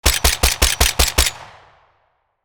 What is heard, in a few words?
Heavy machine gun automatic fire short; guns